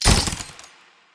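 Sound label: sword, impact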